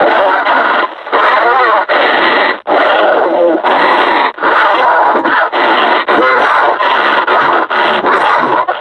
breathing, combine suppressor

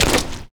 fs fm paint, footsteps; Portal 2

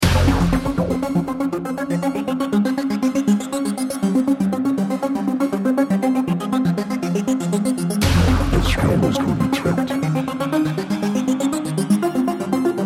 bomb planted

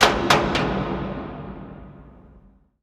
stuck creak, crane; Half Life Alyx; chasm

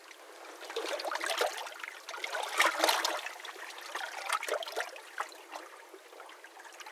ambience canals water bridge

